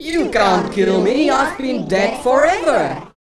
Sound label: VOICE FX, Dance Vocals